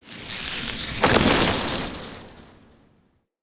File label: Amp Up Pre Cast; Characters; Enemy; Nimrod; Abilities